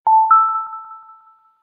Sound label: wii home button sound effects